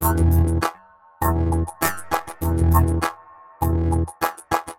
Robo Beat 100BPM, sci-fi Beats

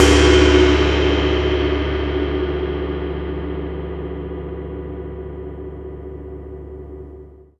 GONG WHIP; ILIO; Synclavier Percussion 2; World & Orchestral

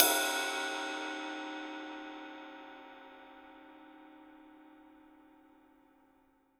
CYM, Real Drum Kit; REAL DRUMS; ROCK KIT

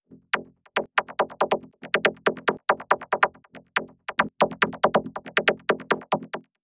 Vocoded Slappy Saw Bass

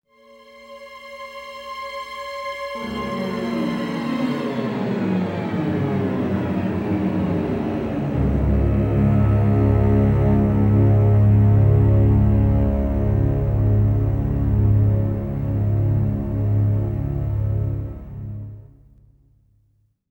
Orchestral Loops STRING ACCENT; Best Service ProSamples vol.33; AKAI

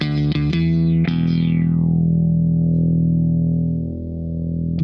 NOTE E1, Funk Guitar; 90 s Sample CDs; Zero G

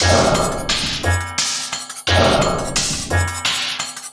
Industrial Loops; USB Soundscan vol.24; AKAI

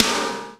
WOLF AGGRESS; 300 Drum Machines

